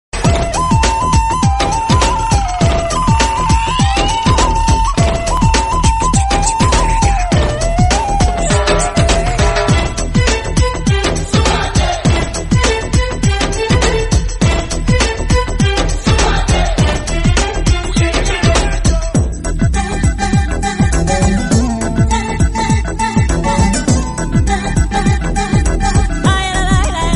flute ringtone instrumental ringtone mobile ringtone; dhoom